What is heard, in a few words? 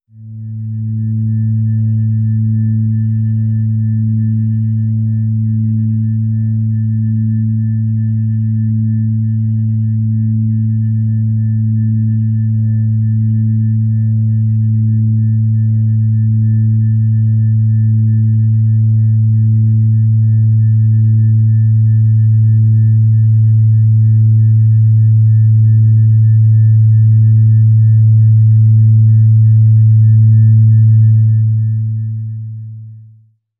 organ tone a